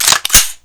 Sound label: bolt pull; weapons; xm8; garrysmod